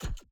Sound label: block Rotate; Mindustry